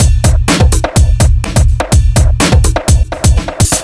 Off Beat C; Big Beat

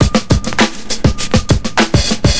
Clapper

